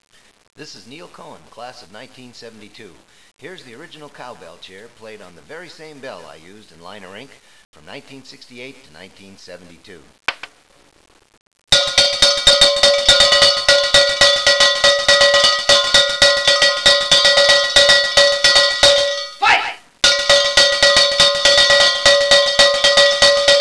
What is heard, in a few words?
cowbell